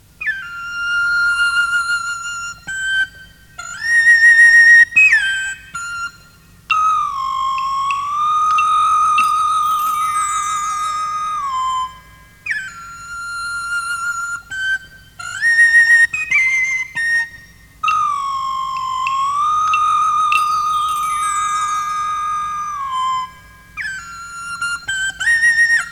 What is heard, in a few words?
INSTRUMENTAL ENSEMBLE, MONGOLIYA